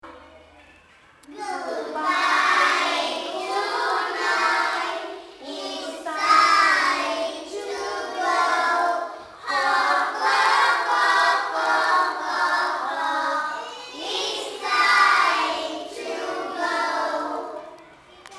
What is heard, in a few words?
Goodbye